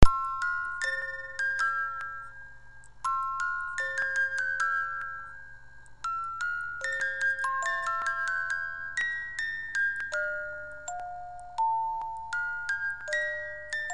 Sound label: polonaise, Boite à musique suisse